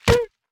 荆棘击打声

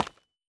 方解石踩踏声

